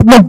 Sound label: Synth